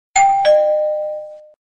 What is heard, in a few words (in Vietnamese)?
Tieng chuong cua Dingdong